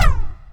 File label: weapon fire